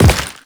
Step Rock, Footsteps; Warframe; The New War; Characters; Kahl

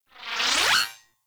Statue Appear; Tenno Hub; Syndicate Cephalon Suda

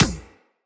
Beach Ball Kick; WaterFight; Beach Ball Floof

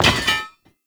Debris Metal Stone; Warframe; Entrati; Ambience

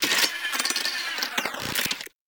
Cave Hi Tech Clicks; Ambience; Warframe; Stalker Cave

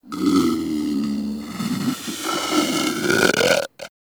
Predator Snore; Warframe; Hunting Critters; Characters

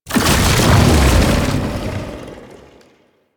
Underground Attack Cast; Enemies; Abilities; Warframe; Proto Infested; Babau

